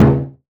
KODO, JAPANESE PERCUSSION; AKAI S6000 CD-ROM - Volume 5; Ethic

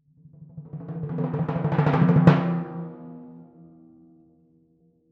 Crescendo-hit E, Timpani; PowerFX; Downtown Orchestra

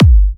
G, kicks; markus hakala kicks

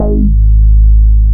BAS MINI, Mini-Moog

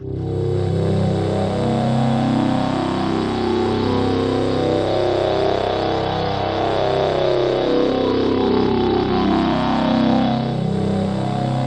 DRONESW, BIG SWEEP; Masterbits; Vintage Voltage

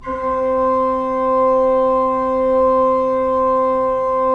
3D Pipe Mix Celeste, 3D Organs

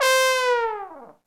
TROM LNG FALL

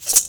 SINGL RATTLE; Best Service; World Colours

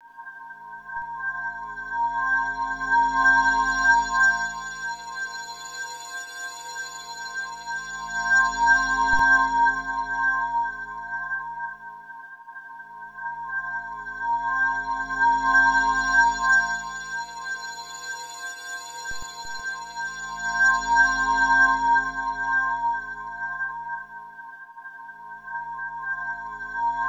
FROST; Spectrasonic Distorted Reality 1; FROSTY

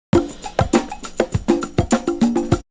Percussion; Coldcut's Kleptomania VOL1

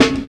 SNARE 2

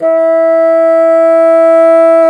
BASSOONS, Bassoon Swel; Roland LCDP04 Orchestral Winds; WND Bassoons

